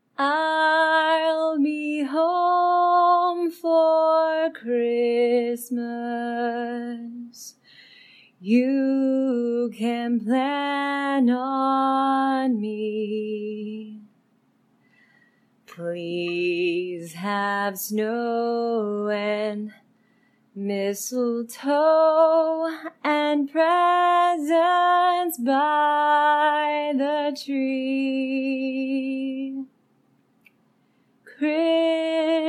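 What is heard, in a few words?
singing a capella Christmas song, female; F4A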